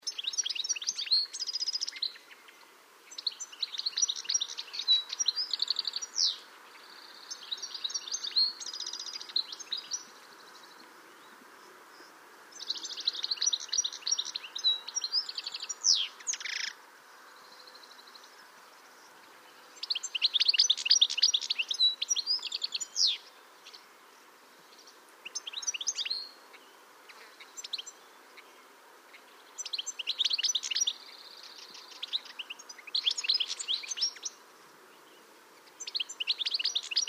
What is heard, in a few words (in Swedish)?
Steglits